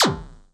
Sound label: One Shot Synth Blip, Drum N Bass - Percussion; Sonic Foundry (Sony Creative Software); Knutrix Drum & Bass